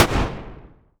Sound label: very short blast or gun fire; imphenzia; Sheriff